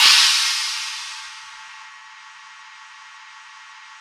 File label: Yamaha CS6, Drums